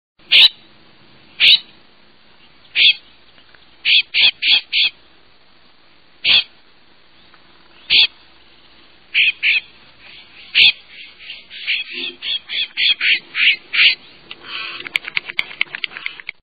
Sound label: Western Scrub Jay; Birds